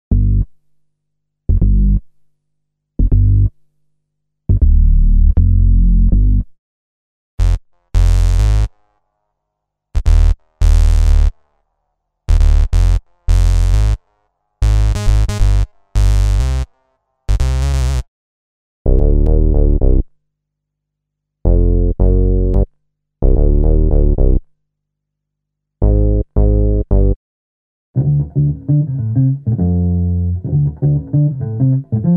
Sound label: DRUM samples Hip Hop Bass Loops